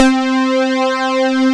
JUNO POLY C4; E-MU Formula 4000 Series Vol. 3; Analog Odyssey; VK+ Instruments

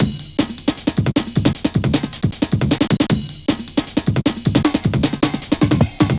drum loops, jungle; Sub-Urban 03-155; Jungle Warfare 1&2

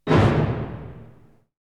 Hit; ZERO G - Datafile DISC 1

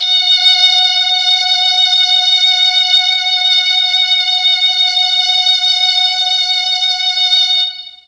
F#3 3 violins, Mellotron MKII violins; Mike Pinder - Mellotron Archive CD

